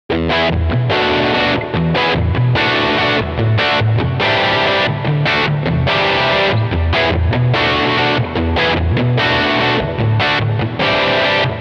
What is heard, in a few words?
hall; bigsky; Arena Rock